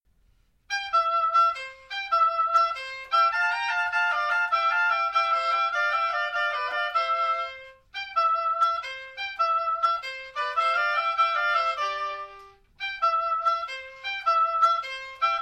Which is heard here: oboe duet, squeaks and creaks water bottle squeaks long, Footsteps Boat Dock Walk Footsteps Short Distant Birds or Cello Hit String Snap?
oboe duet